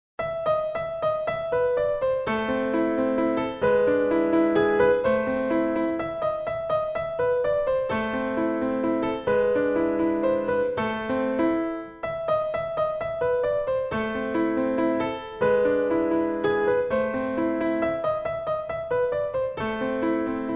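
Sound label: Beethoven Für Elise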